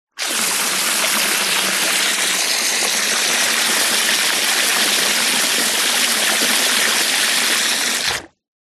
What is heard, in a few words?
household water spray hose jet into swimming pool short burst close up surface; zapsplat